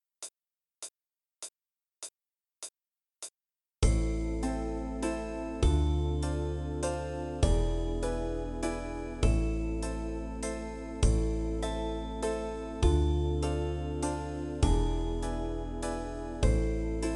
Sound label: Am Cad w Dm7 Waltz